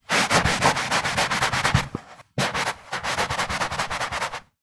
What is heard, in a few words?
household clean rub scrub carpet